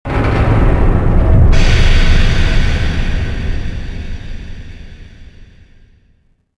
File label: piston stop; movers platforms